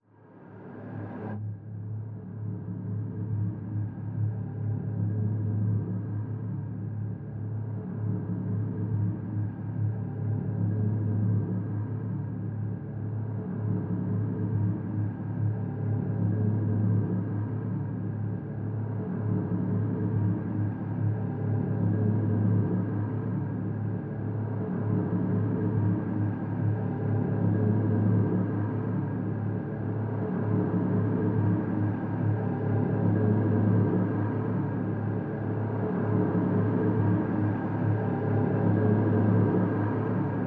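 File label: drone simple G; Drn Bleeding Out Simple G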